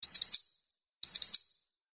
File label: htc alarms beeps; ringtones